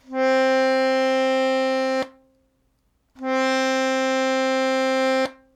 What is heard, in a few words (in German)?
Bando Ton mit Druck, bandoneon